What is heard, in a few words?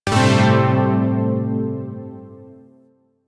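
Shawzin Major Chord; Zariman Shawzin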